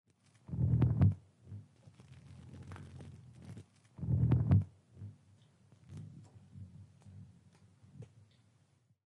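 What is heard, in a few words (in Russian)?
Мотылёк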